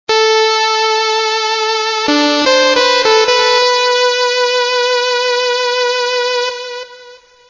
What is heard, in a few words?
saw wave